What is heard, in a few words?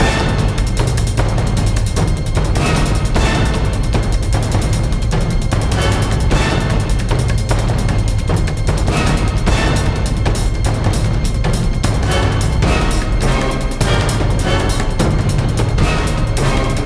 Battle; Knock 'em Downs World's Fair; NDS; Gluco Battle1